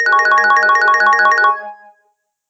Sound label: MELODY